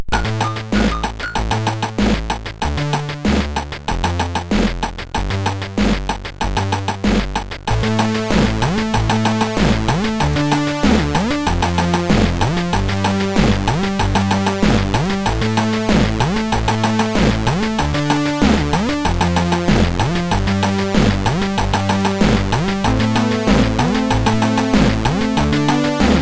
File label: Digi, MOS6581R2; New Digi 8